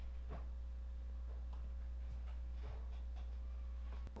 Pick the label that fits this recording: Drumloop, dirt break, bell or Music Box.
bell